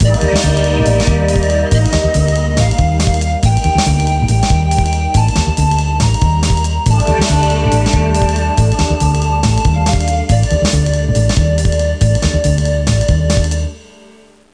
modjazz

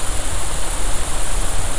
halon; A-10CUBA

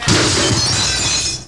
GLASS

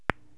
bal hit, 9ball

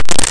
click; gomoku

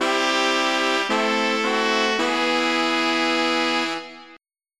horn fine; Wired for Sound Pro CD; Aristosoft, Inc.; music

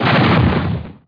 CANNON

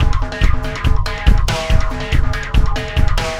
Wah Wah FX; ACID MUSIC

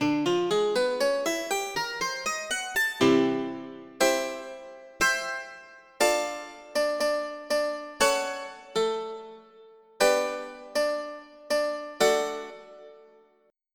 Ersatz ichord

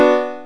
piano Bm; CLARITY

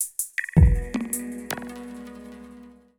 PERCUSSN, AMBNT, 160; Ambient; Drum

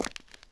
Hard Step, Footsteps

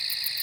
GRILL

